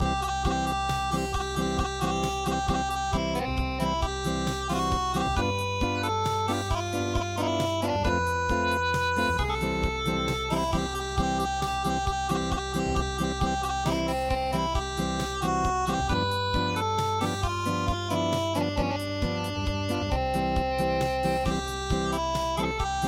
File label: Acoustic, midi; Hail To Britannia